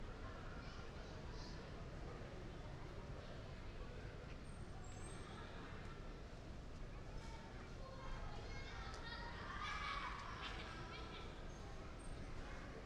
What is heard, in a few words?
Ambience, Wind In Tre; City Sounds